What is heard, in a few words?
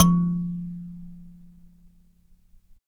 Dyna Sansa; Roland LCDP11 Africa VOL-1; Sansas